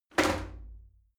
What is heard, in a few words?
shower slide door open hit regular